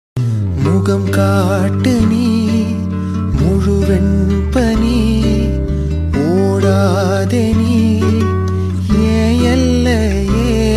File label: love song